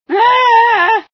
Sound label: scream, female sci